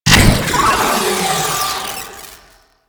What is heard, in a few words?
Sentient Player Scanned; Enemies; Sentient Movement